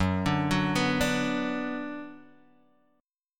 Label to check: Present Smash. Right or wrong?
Wrong